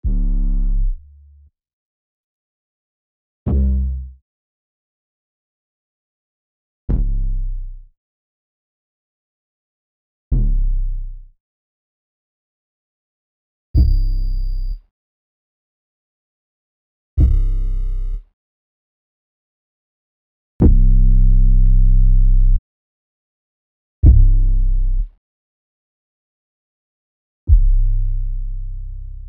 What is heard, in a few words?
808 samples